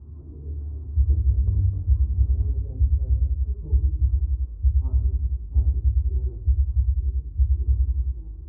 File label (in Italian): DAVANTI ALLA TENDA BATTITO CARDIACO; MONTEBELLO